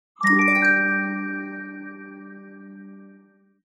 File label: Level Pass; Buildalot